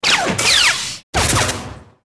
lightsaber hit Human; weapons

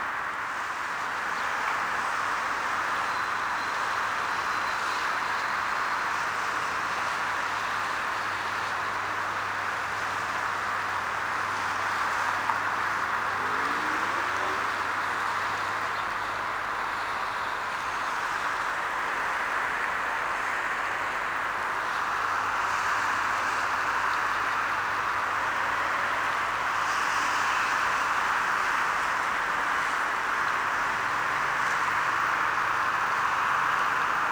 Paris River, Ambience; Project Gotham Racing 2

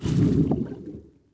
under swish

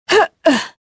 jump; tavion

